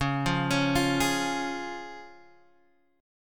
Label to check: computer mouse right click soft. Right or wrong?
Wrong